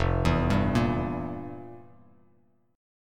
F Major 7th Suspended 2nd Suspended 4th, F, down, Bass Standard; FM7sus2sus4 arpeggiated; JGuitar